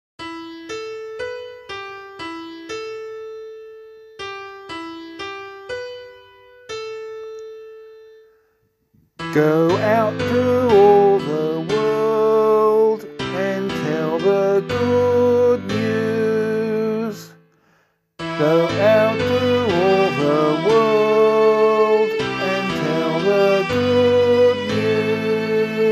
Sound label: Psalm C vocal